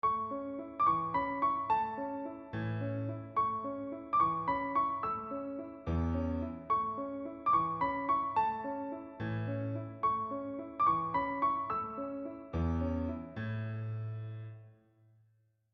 Rondo; Kerman 203

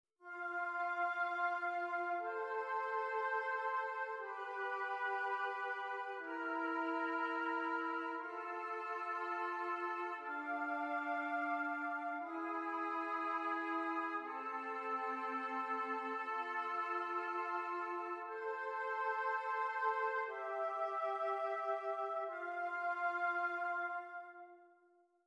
esercizi di contrappunto semplice A due voci

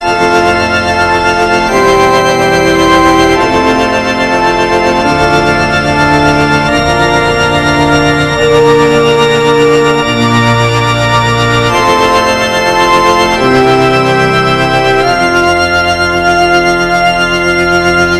Esercizi di contrappunto a tre voci; Erste Gattung; Ronge pagina 61

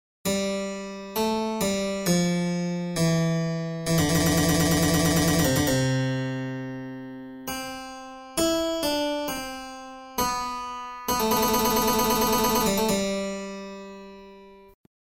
Tema e risposta

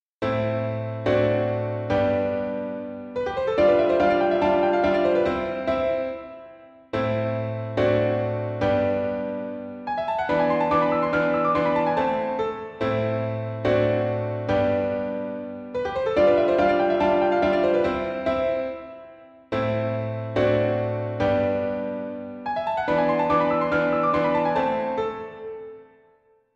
Abbozzo di una sonata presto in la maggiore per pianoforte; Quaderno Kessler

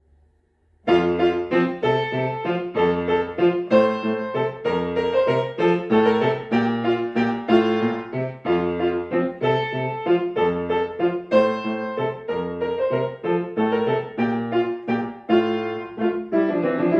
Minuetto con Trio; allemandes no 3 e 4; Kerman 202

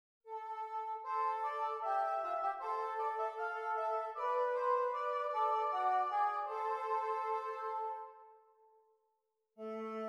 esercizi di doppio contrappunto nella decima; Esercizio 2 corretto

